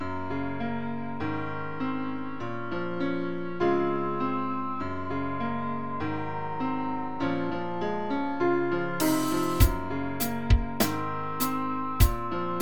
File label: FluidR3 APiano